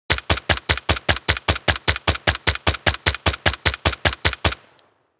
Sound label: Slow firing machine gun long burst; guns